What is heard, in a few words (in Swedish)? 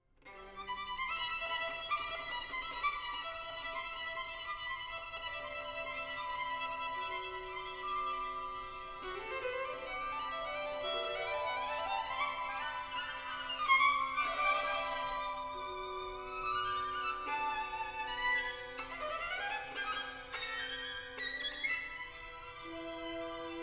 Violinkonsert